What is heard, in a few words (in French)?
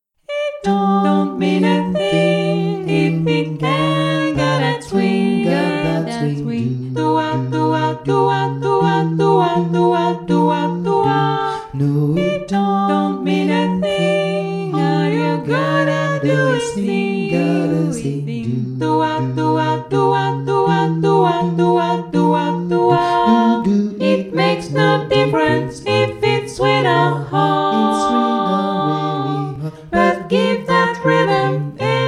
tutti voix